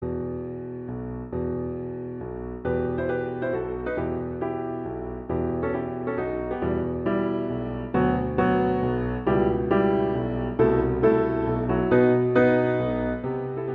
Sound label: Folk Melody; Grieg; Lyric Pieces